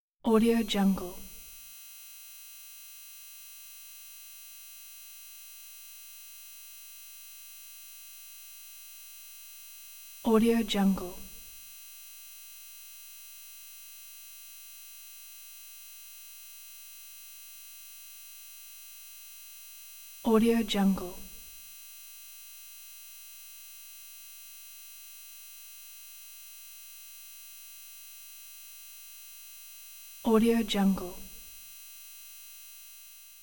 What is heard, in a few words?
scifi device thin buzz; hollywoodedge